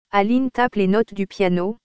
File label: piano; Aline tape les notes du piano